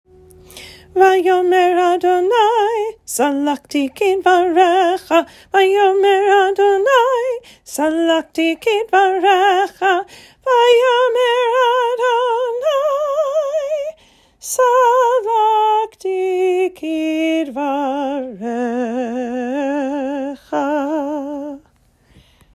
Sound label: Alto Vayomer Adonai